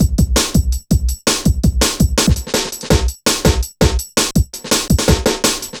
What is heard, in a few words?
Programmed Stepz, Jungle Drum Loops